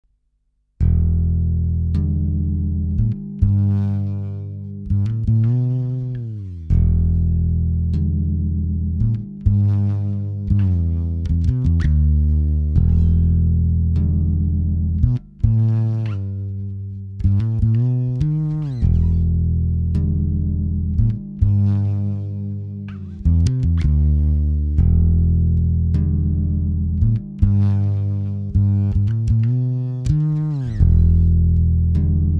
Godin Fretless Bass Mid Boost Comp Maximzier; BBE

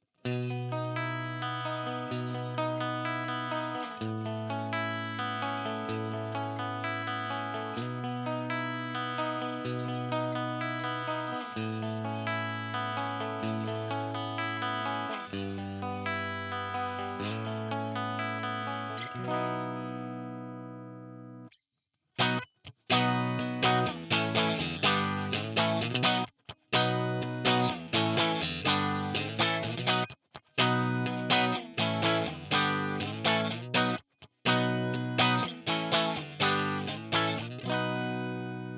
DK24 pos2 CLEAN; Charvel

